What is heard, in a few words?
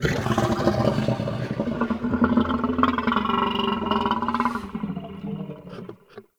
Ext Dist Animal; Ambience